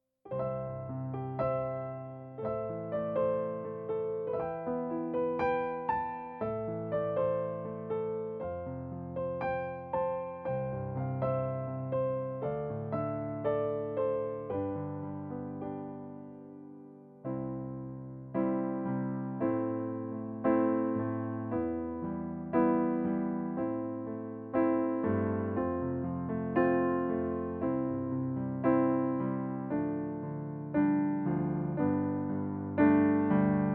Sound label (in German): Playback C-Dur